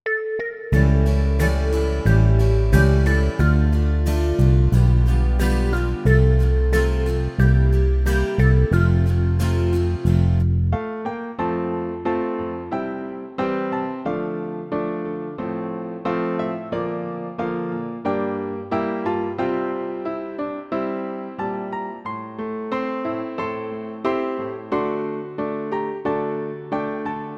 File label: Karaoke